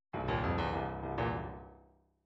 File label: midi fills rockfunk